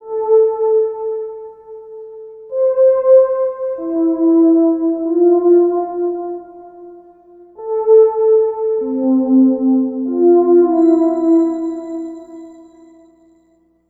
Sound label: Fresh Flutey, Synths; Sonic Foundry (Sony Creative Software); Modular Electronica